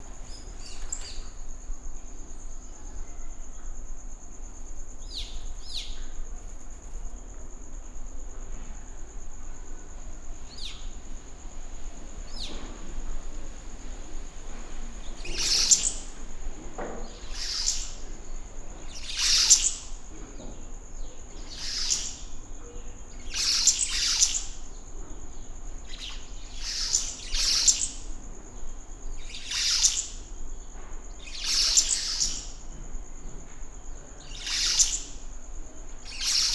Mascarene martin

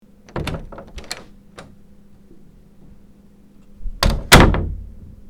door opening and closing

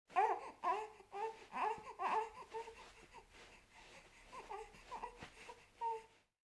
baby cooing

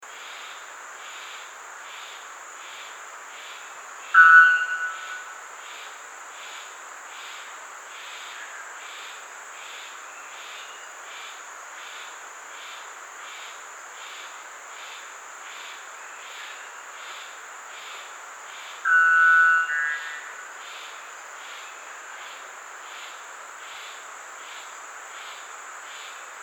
white bellbird; پرنده بلبرد شکم سفید

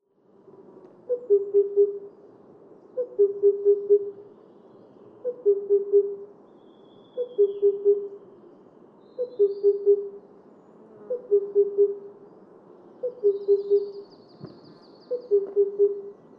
Himalayan cuckoo; پرنده کوکوی هیمالیایی

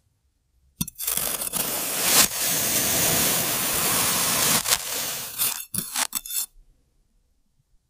garden trowel

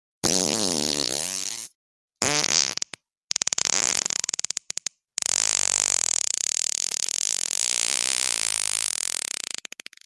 fart

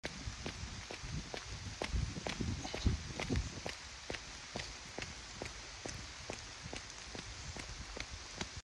Running in rain

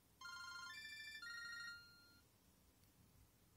qtek ringtone

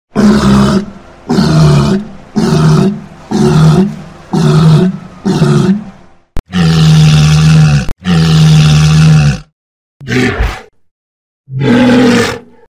Walrus

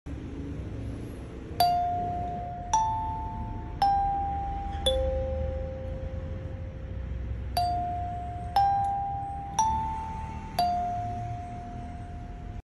Japanese school bell

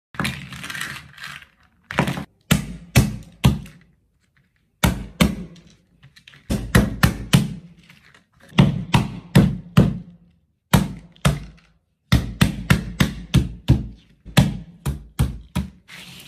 cracking walnuts; شکستن گردو

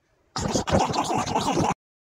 Goblin

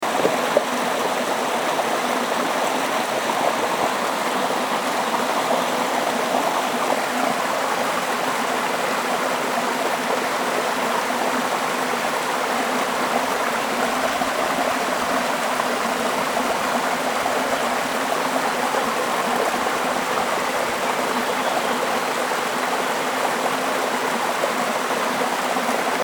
waterfall, nature sound

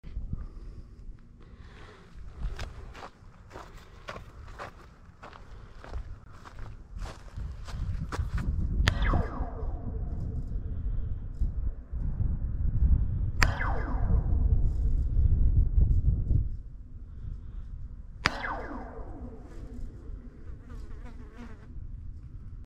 Metal wire

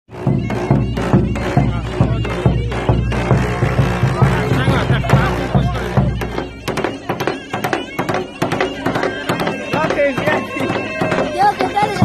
timpani; ساز دهل

